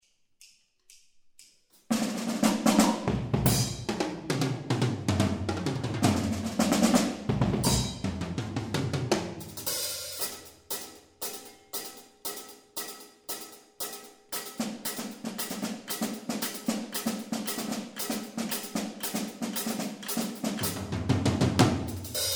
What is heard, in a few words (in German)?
Tambouren